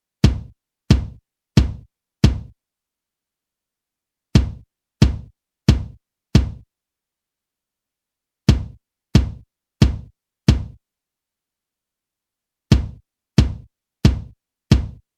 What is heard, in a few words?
Kick Drum 250Hz boost